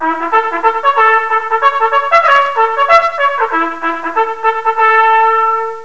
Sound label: assembly, Bugle calls; American Civil War